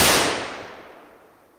loud bang

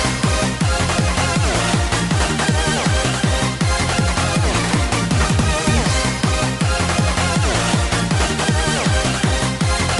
eurobeat; evangelion

